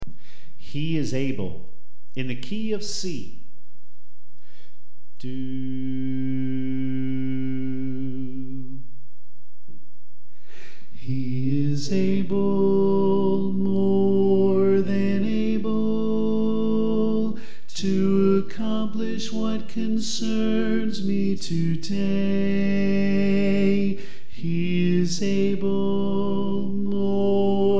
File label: Tenor